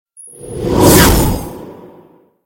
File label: Metal Tones